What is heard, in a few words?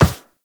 Walk Metal, footsteps; Warframe; Characters; Operator